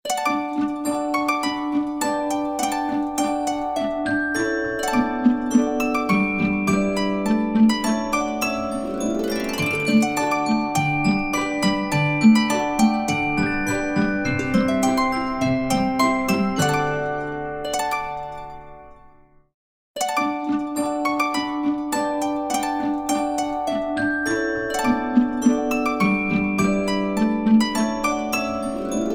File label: Anticipation, ringtones